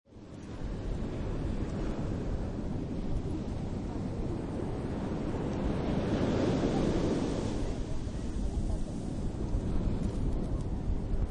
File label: Beach Resort, Slow ocean waves washing up on sandy; Point Pleasant Beach Resort: Slow ocean waves washing up on sandy beach. Distant, very faint sounds of people swimming and; Outdoor Sound Effects